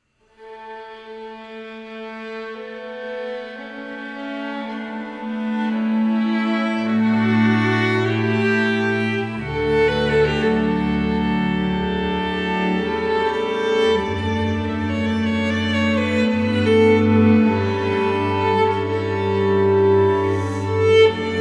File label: oboe